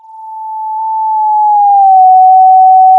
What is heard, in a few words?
Sound of a police car siren